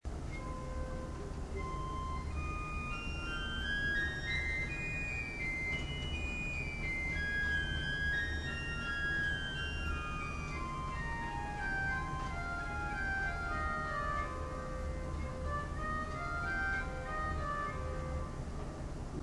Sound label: dolce, orgel